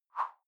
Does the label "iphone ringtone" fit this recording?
No